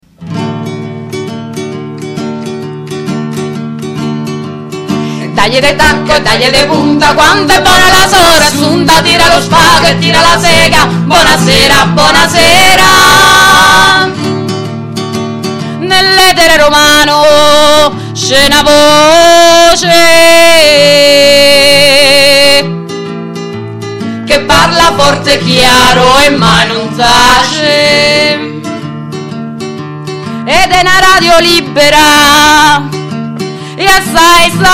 Trio

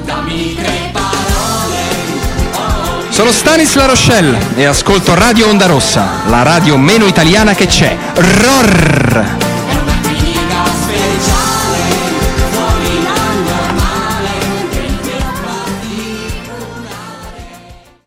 jingle

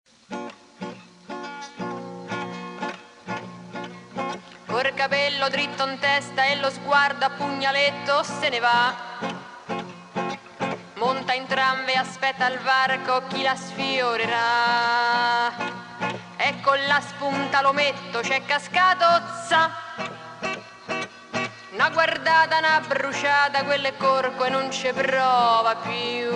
Tango della femminista

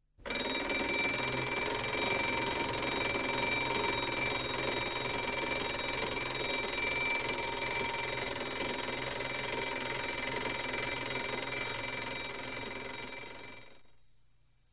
Suoni - Sveglia

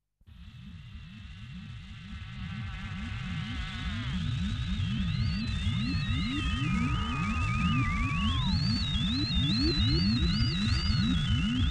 Effetti sonori - Apparecchiature astronave

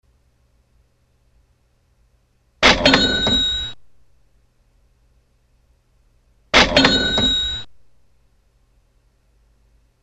registratore di cassa

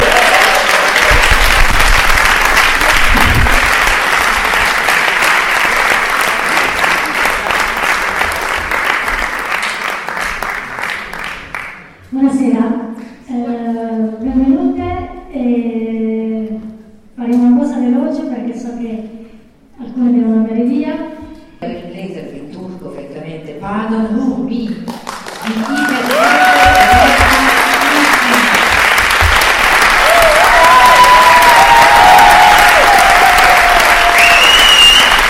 applau public

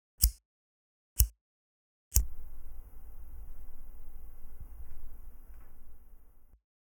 Accendino

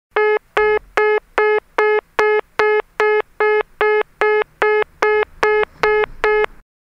suono linea caduta telefono fisso

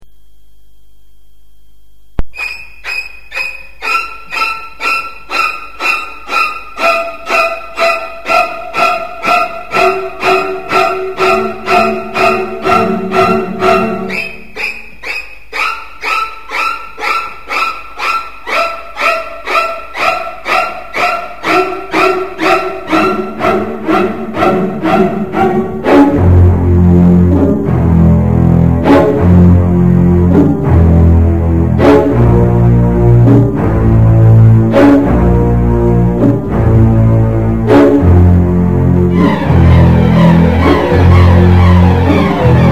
Effetto Suspence; psyco

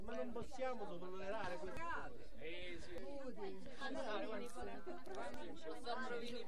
brusio

